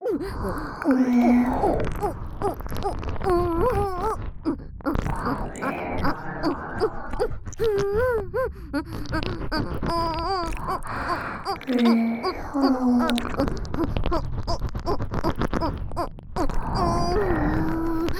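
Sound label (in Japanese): オホ喘ぎ SE無＋ぐぽぐぽシンクロ耳舐め; 3WAYループ; おまけループ（オナサポ）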